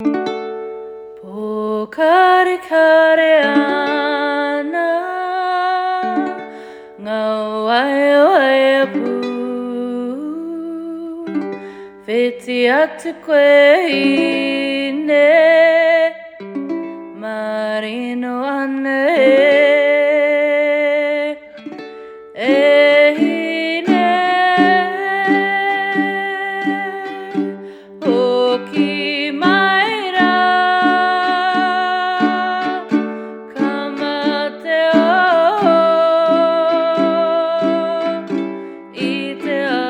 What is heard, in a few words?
Uke; pokarekare ana; Uke book